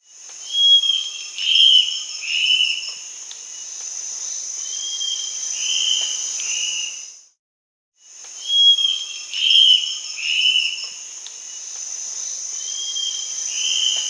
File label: Black-spotted Bare-eye (Phlegopsis nigromaculata), Peru; S. America